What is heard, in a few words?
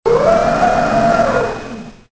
forklift; Soldier Of Fortune II Double Helix; XBOX